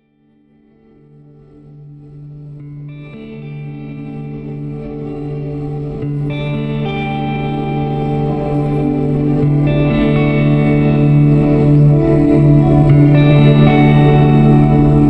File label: Drone